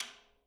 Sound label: STK SHELL, Living Drums; Sampleheads; Peter Erskine Living Drums; JZ XTOM 1